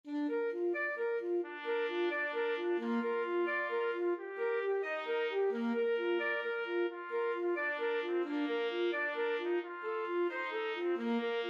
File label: o holy night ASAX TPT, trumpet-saxophone duet